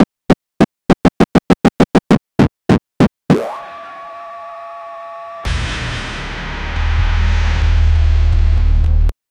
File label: the raising fx sound, amapiano